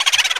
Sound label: boo